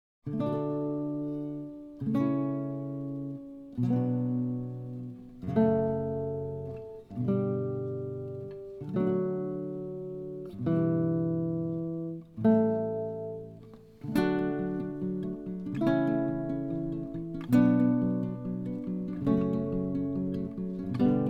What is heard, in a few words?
Canon In D, classical guitar